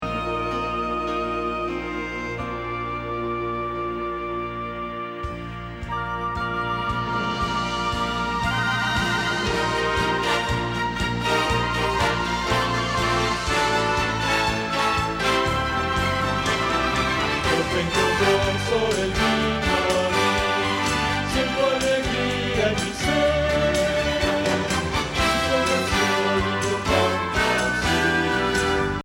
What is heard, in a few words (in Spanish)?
Bajo